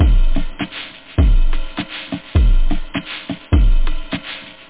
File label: conga, techno; OctaMED SoundStudio V1